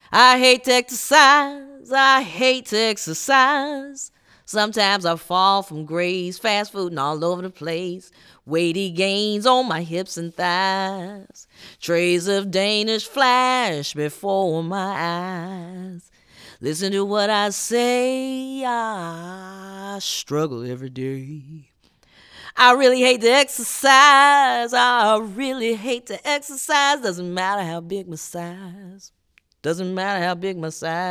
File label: I Hate to Exercise sung